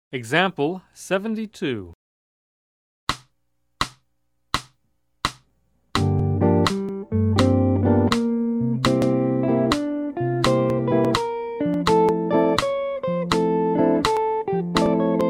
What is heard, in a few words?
Jazz; jue shi ji ta